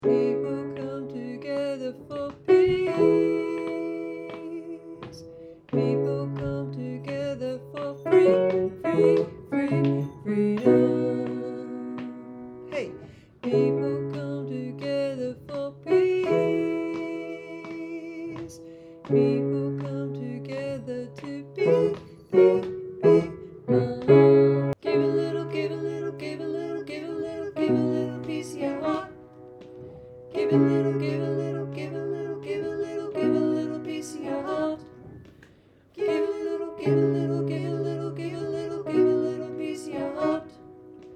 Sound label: Alto, voices